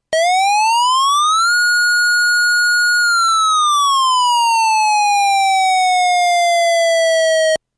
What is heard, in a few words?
xcel manual wail